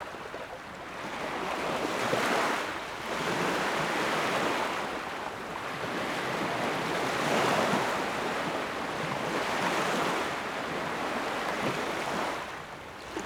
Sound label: water lapping sea waves sandy beach 5 meters; RedSonic; Water on Rocks